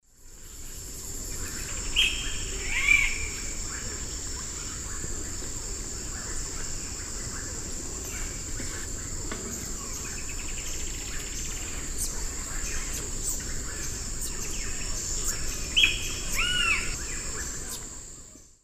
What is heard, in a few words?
Piaya cayana, calls; S. America; Eastern Lowlands